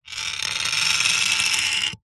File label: industrial metal lever pump squeaky creaky single very slow action; zapsplat